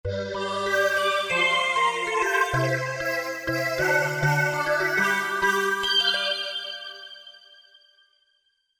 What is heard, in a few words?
Voice Bell, Korg MSC-1S Variety Combinations